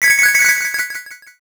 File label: coin shower